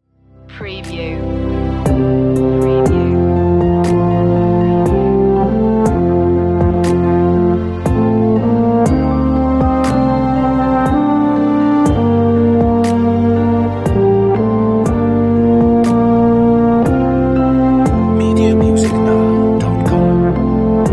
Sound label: away in a manger euphonium feature